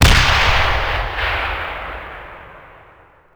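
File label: bazooka soldier fire; WAR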